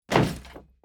zone barricade hit

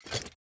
formation editing

